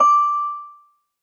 soft hit whistle; osu!

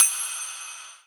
drum hitwhistle; osu!

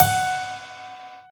normal hit whistle; osu!

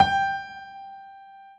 drum hitwhistle; osu!

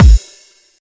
normal hitnormal; osu!

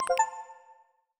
recording start; steam; steamui

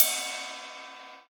soft hit whistle; osu!

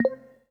ui default activation; steam; deck

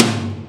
normal hitclap; osu!

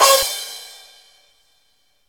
soft hitfinish; osu!

